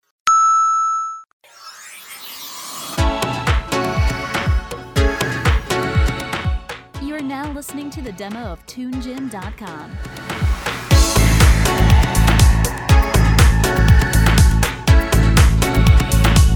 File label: Tango; Tunegym